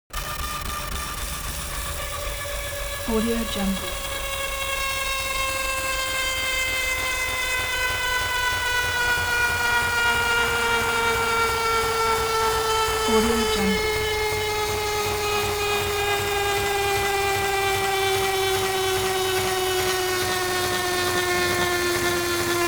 transportation bicycle spin tire scratch paper

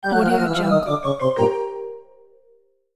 cartoon tuba fail

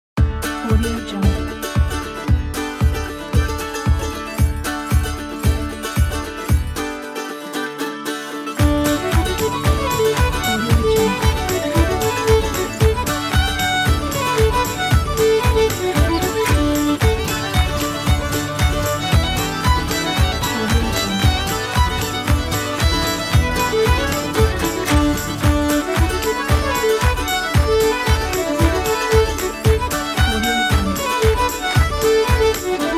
uplifting irish jig

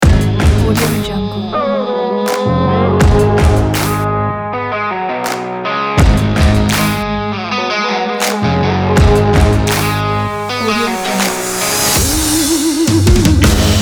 sport rock trailer pack

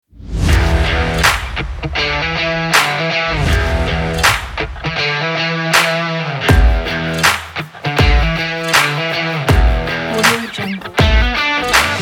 podcast intro

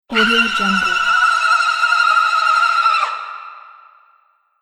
banshee scream

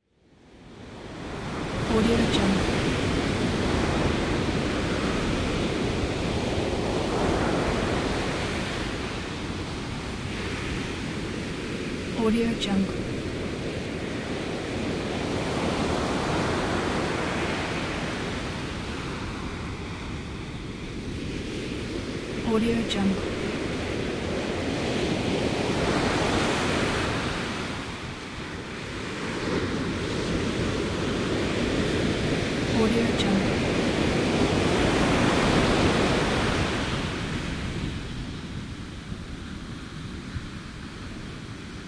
distant sea waves

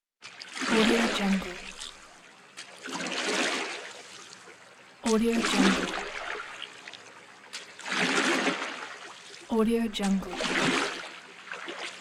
rowing boat

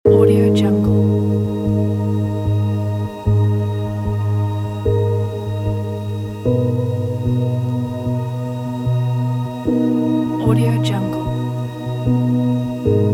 atmospheric ambient soundscapes

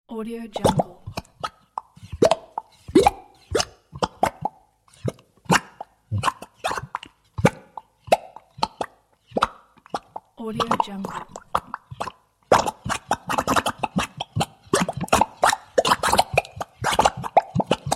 boiling potion; soundmakefx

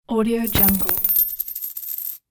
coin droping

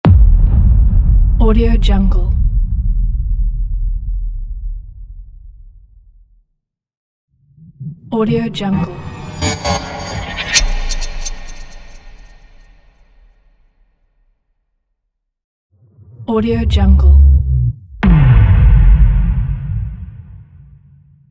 massive sub hits sound pack

